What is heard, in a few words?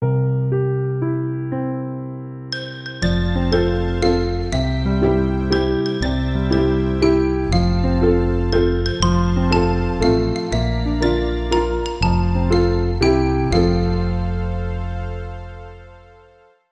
Xylophone Happy Birthday